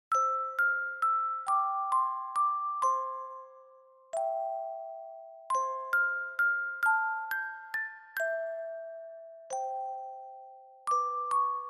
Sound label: Lullaby Reverb Fast; bgm; Mozart